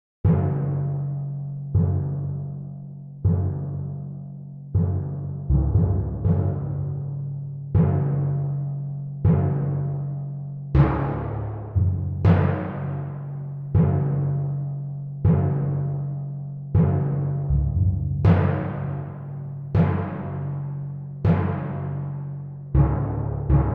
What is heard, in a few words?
timpani; playful waltz